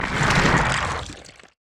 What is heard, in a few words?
vomit; Crawler broodmother